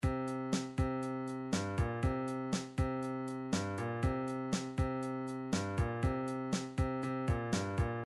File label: rock, midi